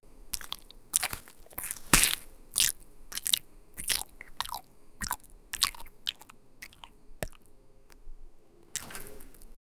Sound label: chewing